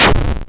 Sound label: break